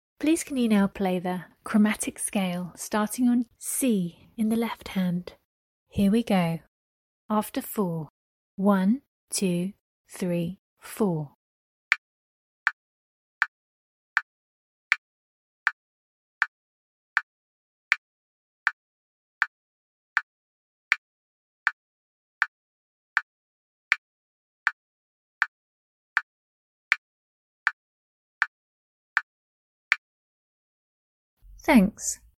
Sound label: chrom scale c lh